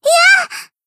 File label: Shout; BA; Mari; Sportsuit